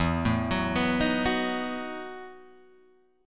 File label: E Major 7th Suspended 2nd Suspended 4th, Guitar, Open G